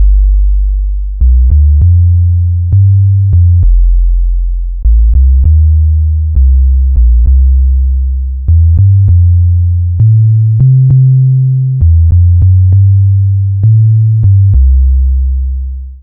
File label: Blues